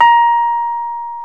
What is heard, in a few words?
PNO, Rhodes Mk.V; Keyboards of The 60's and 70's